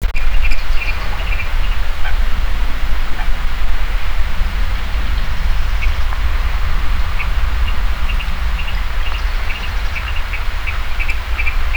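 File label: tick-it, Virginia Rail; National Marsh Bird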